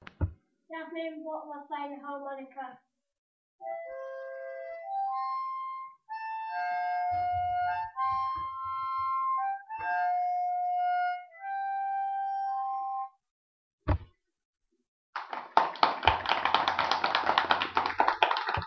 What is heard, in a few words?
harmonica